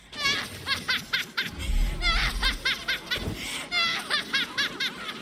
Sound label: Hahaha